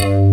PLUG BASS; Pro-Rec - Synthsonic; BASSES

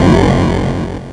Atari Noise Long, Atari 2600; 8-Bit; Chiptune; FX

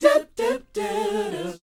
SHOO BE, Vocal R&B Dance

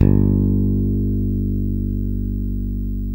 JAZZ, JAZZ BASS

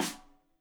Rim Shot Stereo; Deeply Sampled Drums; SMDrum

